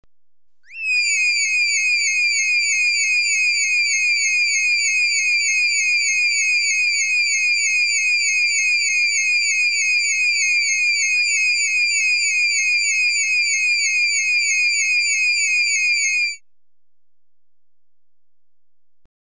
Mewing Kitten Alarm (Higher Pitch), Electronically Generated Sounds; BBC Sound Effects Library